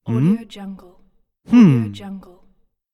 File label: man "hmm"